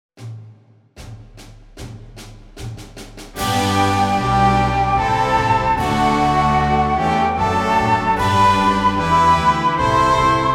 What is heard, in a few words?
Military Band; National Anthem